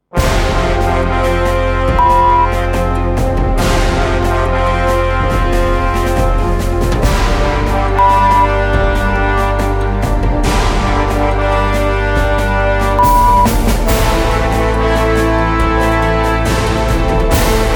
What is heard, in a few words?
70bpm